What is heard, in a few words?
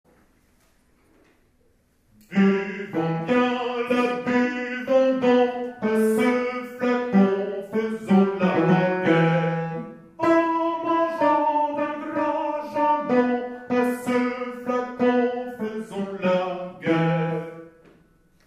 Tenors; Tourdion